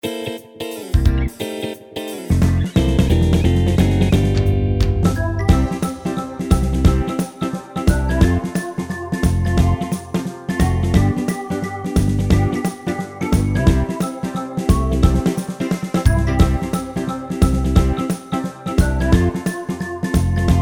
Drawbars Rock n Roll Yamaha; Wersi

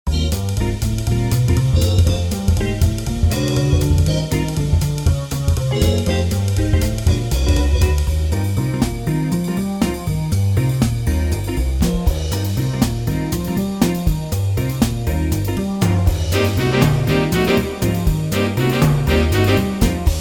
US cookin jazz; wersi

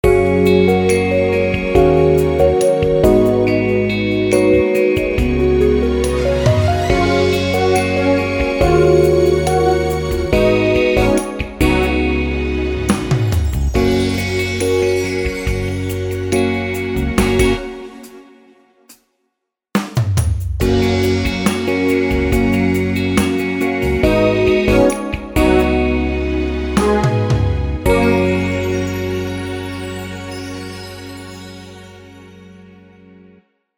16 Beat Ballad; Wersi

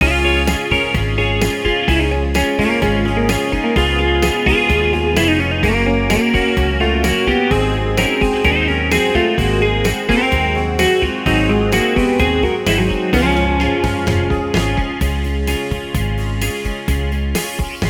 country rock; wersi

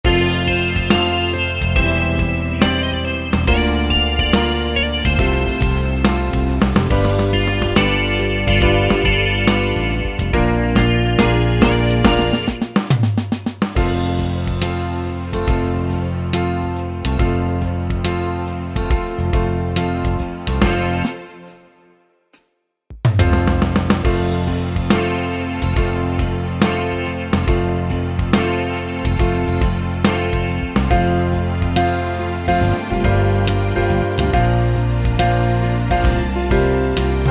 Piano Shuffle; Wersi

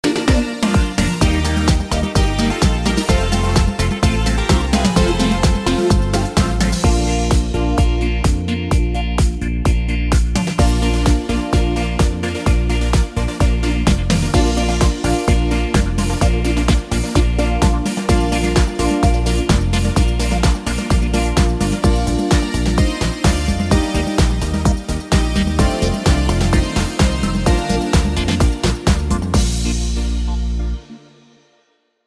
schlager beat disco; Wersi

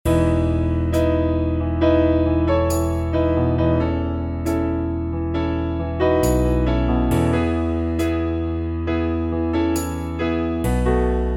piano ballad; wersi

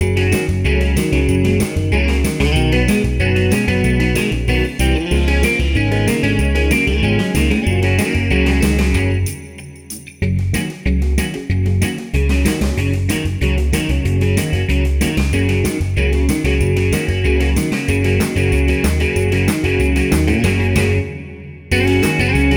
skiffle; wersi

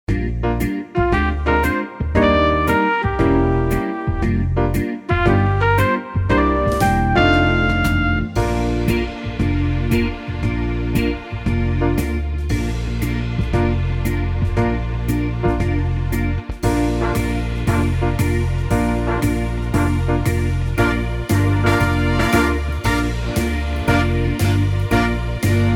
Slow Foxtrott; wersi

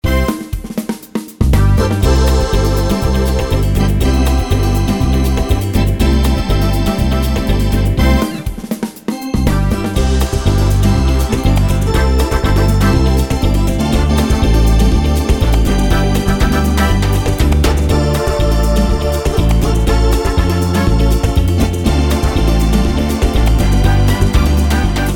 Drawbars Samba; Wersi

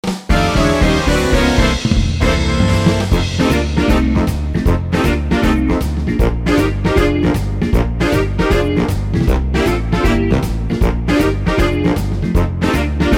Big Band Shuffle; wersi

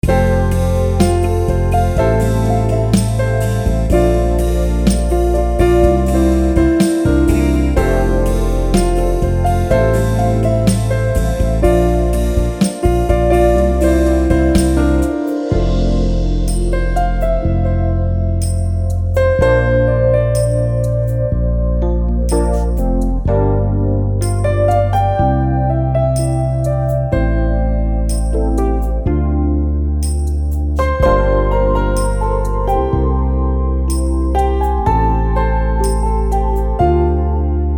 piano beat ballade; wersi

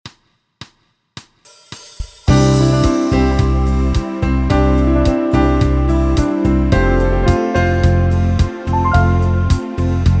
Piano Beat; Wersi

